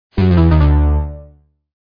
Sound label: lose; Brazil; buraco